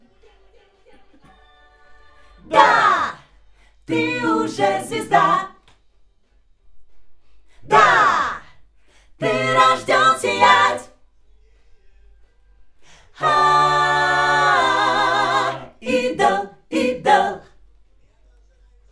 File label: Schoeps AKG, piano